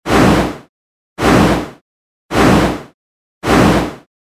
モンスターの羽ばたき 4回 遅め モノラル

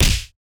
インパクト ピシ

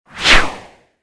矢の飛ぶ音; モノラル

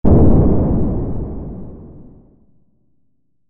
爆発

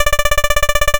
会話; nes retro talk sound effects

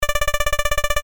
会話

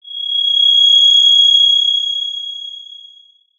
キーン

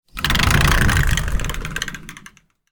横開きのドアを開ける音; 日常生活的音效

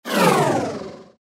能力低下

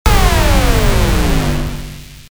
ビーム砲 モノラル

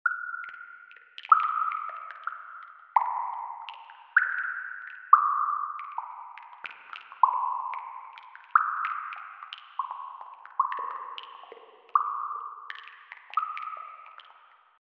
洞窟の中の水が滴る音 立体

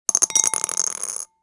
チンチロ サイコロを振る 小丼; ボードゲームやカジノ、麻雀の効果音です。

